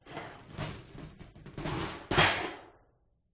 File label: Metal Chain Drop Sheet; sound spark